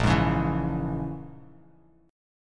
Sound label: Bb Minor 7th Flat 5th, Bass Standard; 6,11,11,9; strummed; chord shape